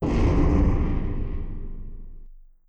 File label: Collision